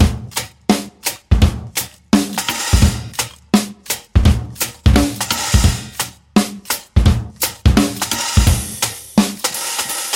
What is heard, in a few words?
Loop Jam 85 Bpm